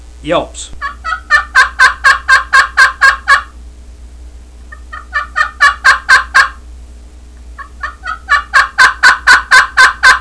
yelps, friction, turkey; supersound 2000; canecreek

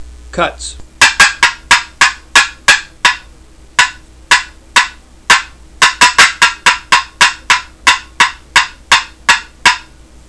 poplar cutts; dixie

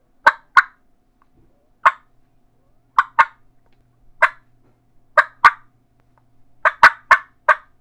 smackdown clucks, turkey; Southland

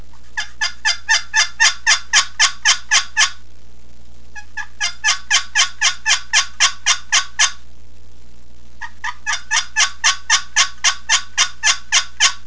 purple heart yelps, turkey; dixie

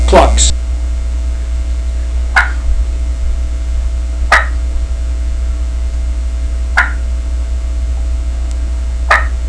blackbeard clucks, turkey; Southland game calls

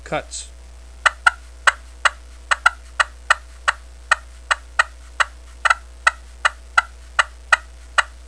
alum cutts; primos

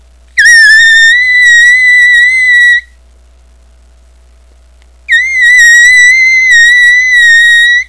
high squeal, turkey hunting

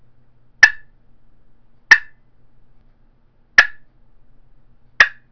cedar maple boat paddle clucks; Southland Sounds

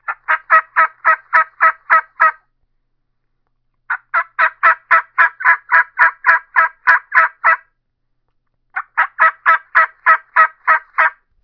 dynamite super mouth call yelps, turkey; dixie

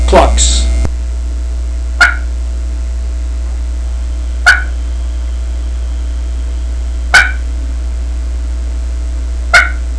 spring delight clucks, turkey; Southland

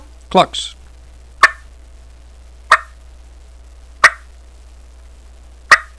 clucks; Kirby Klassic; mouth

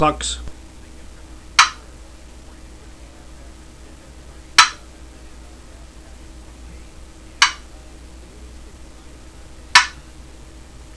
walnut clucks, turkey; dixie

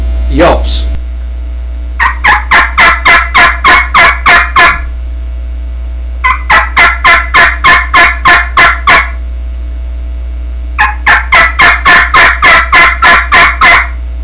early morning sunrise yelps, turkey; Southland Sounds

